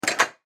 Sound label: Button Switch